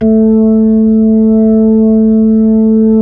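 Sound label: B-3, Hammond B-3 organ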